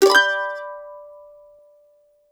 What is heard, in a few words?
CEE CHORDS G2, Blues Guitar Samples